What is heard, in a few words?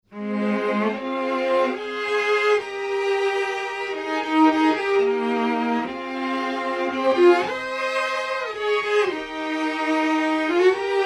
Strings Vc